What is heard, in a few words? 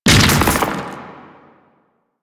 Octopede Horrors Arm Spawn; Warframe; Man In The Wall